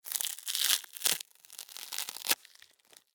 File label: Household Fly Paper Movement Sticky Long; Cleaning (Sound Effects:Household)